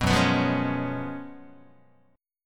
Gb Major 7th Suspended 2nd Suspended 4th, down, Guitar; strummed; 2,2,2,3,0,0; JGuitar